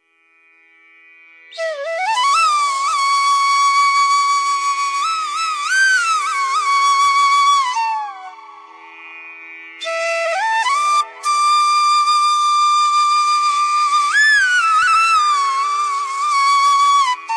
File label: Raag Pahadi